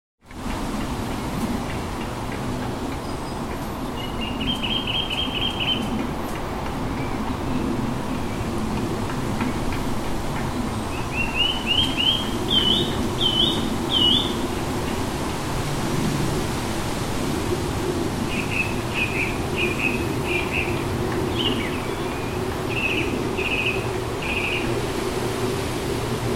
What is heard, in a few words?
field recording in midgery wood with birdsong and wind rustling in the trees